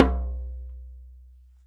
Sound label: TOM BAS, HAND DRUMS; SoundBytes - Early Patches